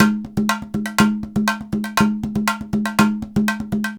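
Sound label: emelabo grooves; Best Service; World Colours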